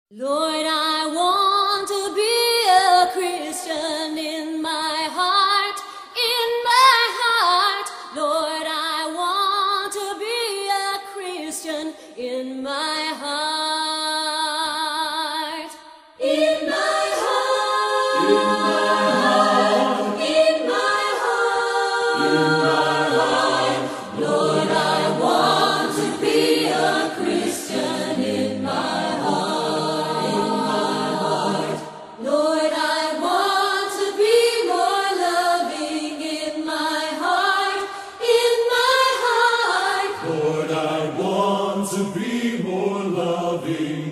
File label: choir